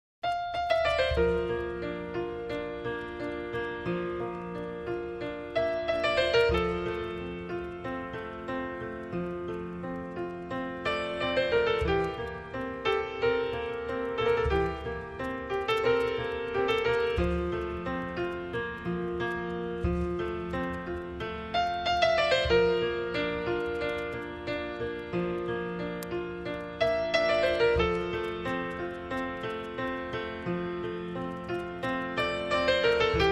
Piano Only

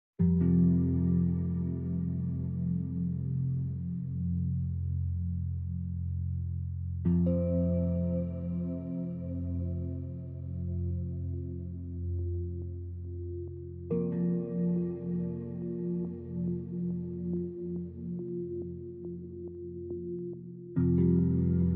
Dark Chime Meditation

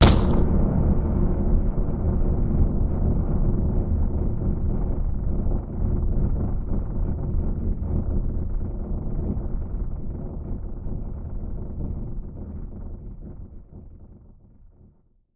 Hard Impact And Rumble

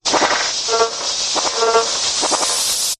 Cellular Breakup

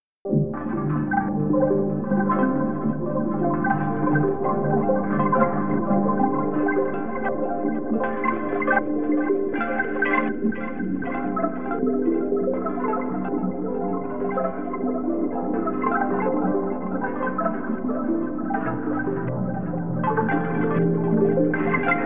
Bamboo House

